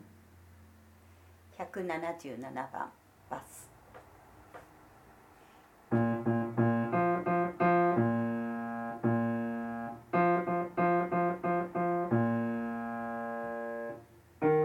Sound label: bass; キリスト集会合唱団・合奏団; 賛美